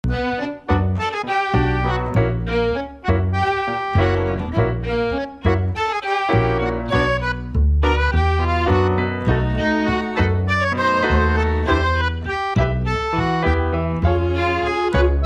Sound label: tango